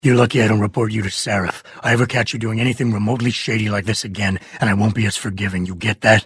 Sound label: jeers